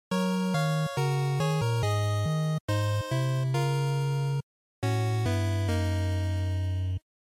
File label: NES Shooter Game Over